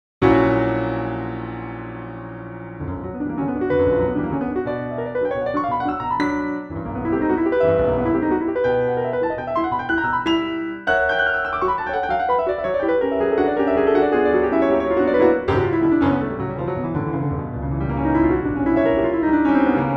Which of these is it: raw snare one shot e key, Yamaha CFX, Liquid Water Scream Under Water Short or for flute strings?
Yamaha CFX